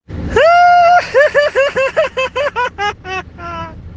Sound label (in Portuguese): risada